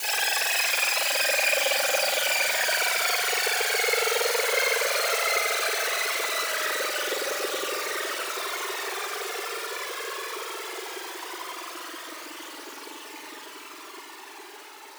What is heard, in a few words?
DOWNLIFTER 128BPM